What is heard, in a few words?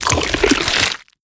open slow, zombie blind jaws; Half Life Alyx; creatures